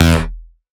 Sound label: synth shot E; Electro; Anthemic; synth shots